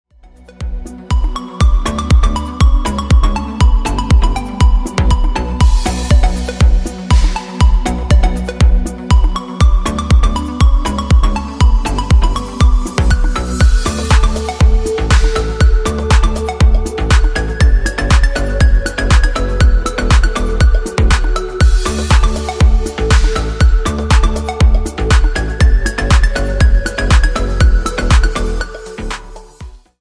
tone

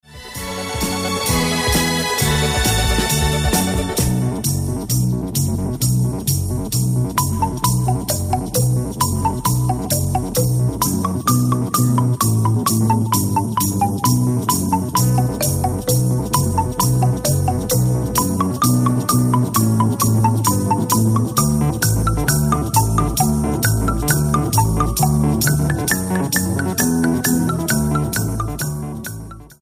radio dance orchestra

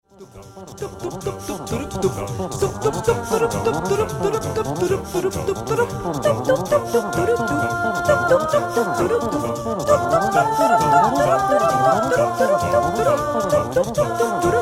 acapella

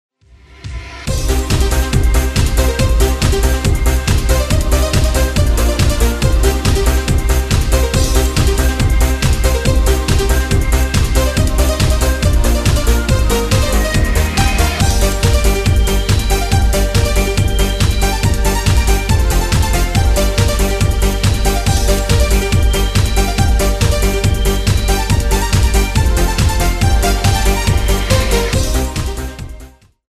outer rhythm tekno

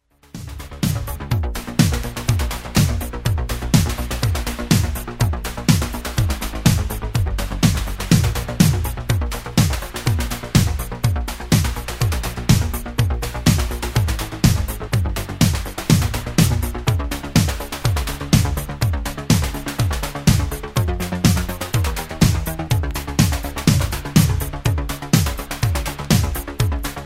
dub, song

